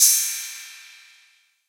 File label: Cymbal, Hip Hop and Trap; Ghosthack; Hip Hop and Trap Freebie